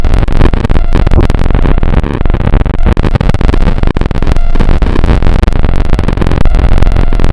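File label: overtone wavetable; Adventure Kid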